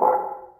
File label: TR kit, techno kit; Pro-Rec - Synthsonic